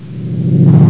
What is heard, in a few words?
teleport; Quake; PainKeep